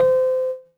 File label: piano c; school; Tony Hawk's Project 8; XBOX; inschool